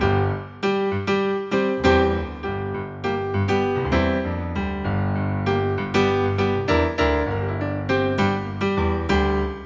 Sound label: piano